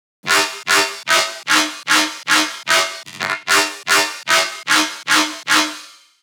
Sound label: Bass 150BPM Wub F